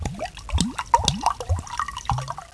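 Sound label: liquid pour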